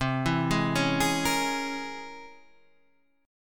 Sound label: C 7th Sharp 5th Flat 9th C